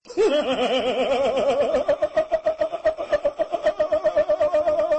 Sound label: laugh kekekeke